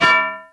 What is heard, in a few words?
Toing; tinus